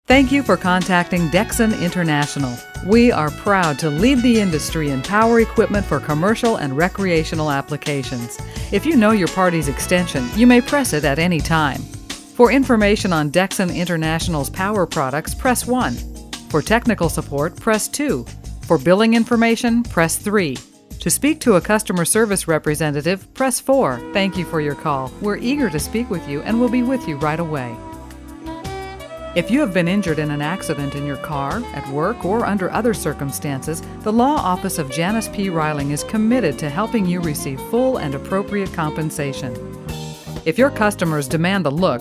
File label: onhold